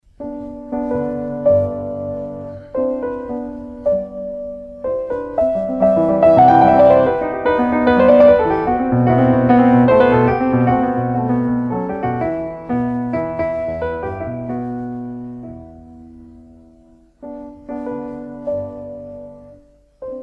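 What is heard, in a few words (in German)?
Klavierstücke Allegretto grazioso; Heinrich Schenker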